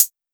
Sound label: soft hit normal